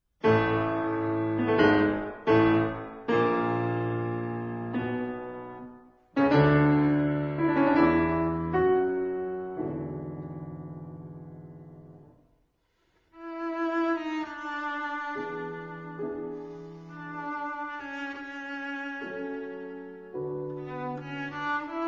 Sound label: Trio fur Flute, Cello and Piano in A major; Johann Nepomuk Hummel; Op 78 cz. II